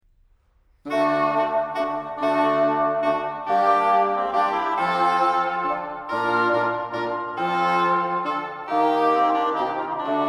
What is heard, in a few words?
gamba